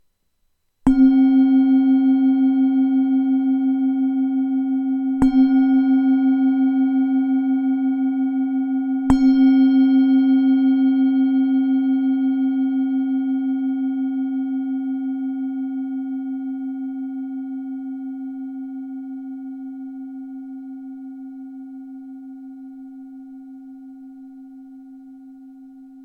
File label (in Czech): tibetska misa; c4; 13cm